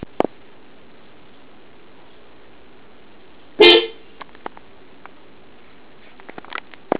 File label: horn normal